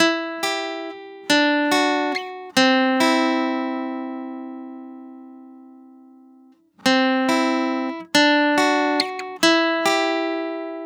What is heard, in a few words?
Guitar 140 BPM F#min; Guitar Loops; Vivid; GhostHack; Ghosthack Advent Calendar 2021 - Day 10 - Guitar Loops and One-Shots